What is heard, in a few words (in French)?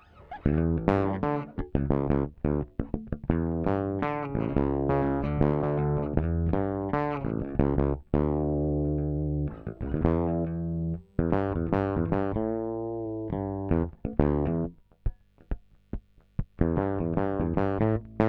grave mediums aigu compresseur rapide doigts, Bass; smpro